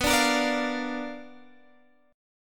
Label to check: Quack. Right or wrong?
Wrong